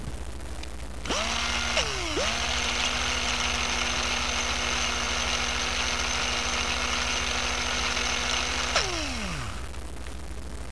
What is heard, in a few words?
DRILL